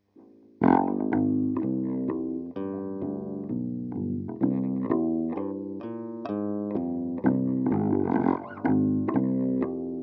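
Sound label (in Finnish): AKG3000 test old bass; Wanha basso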